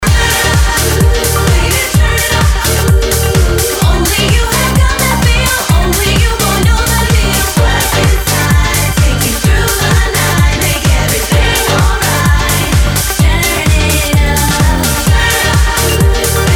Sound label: house